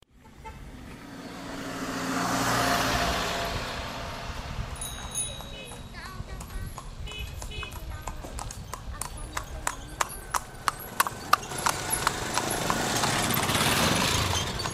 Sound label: horse carriage and car, Old Bagan Myanmar